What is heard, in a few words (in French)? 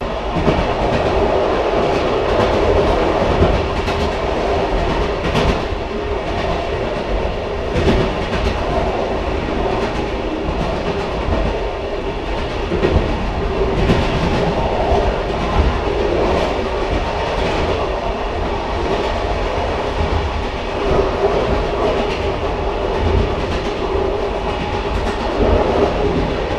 A l'intérieur d'un vieux train